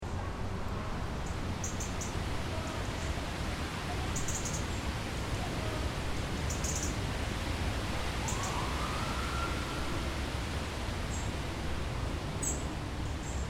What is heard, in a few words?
City Park Wind Through Trees, Park in central Athens, Greece; Few birds chirping, wind rustling in tall, large trees; City Sound Effects